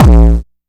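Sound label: TR909 Distorted Bass Drum; Drumset 03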